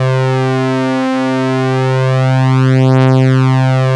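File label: ARP lead C2; Sonic Reality; Vintage Timetraveler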